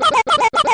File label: baby Bowser Complain; N64; YOSHISISLAND